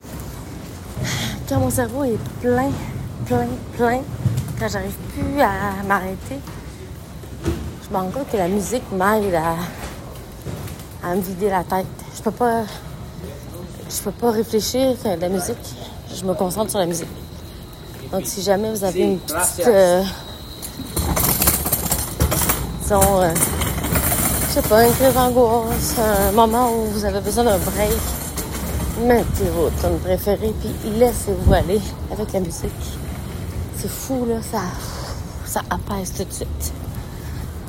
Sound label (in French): la musique